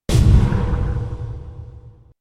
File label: Blink; Blink Dagger